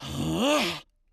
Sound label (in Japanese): 鳴き声